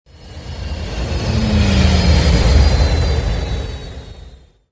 flyby; movers objects; raven

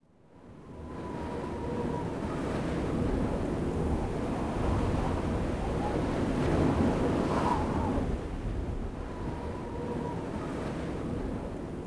wind gust; Star Wars Obi Wan; XBOX

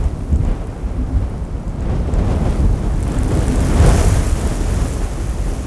gras wind; WhiteOut

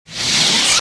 enemy saber off; weapons

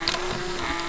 Robot Oneshot; BedRoom

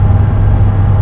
huge plat move lp, movers platforms

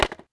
RZR JMP

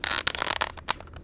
creak

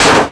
bouncy picnic chair hit; bouncy objects; Tony Hawk's Project 8; XBOX

